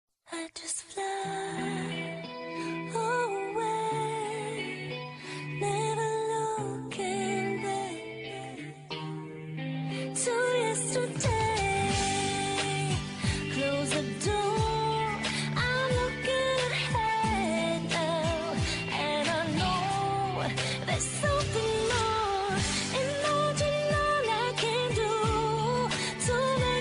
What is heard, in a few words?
samsung ringtone; imagine